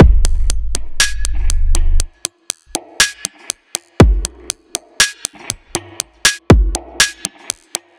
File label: Spectral Wall Beat, Drum Loop; Sonic Foundry; The Electronic Music Manuscript Collection; Drum Loop Structures